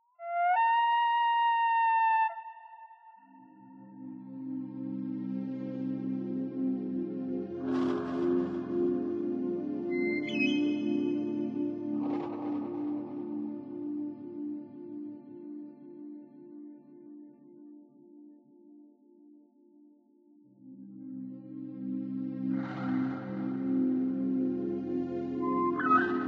deep forest; stendhal

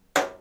clang dull

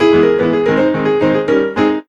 piano

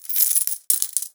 coins

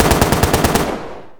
bullet rifle; ufoai; weapons

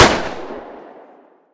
bullet pistol; ufoai; weapons

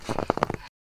snow, footsteps

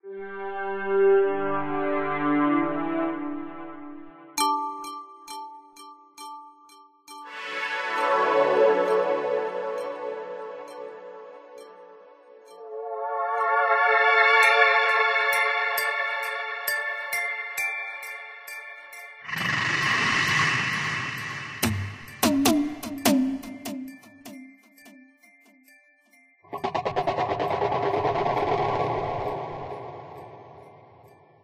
dungeon entrance; stendhal

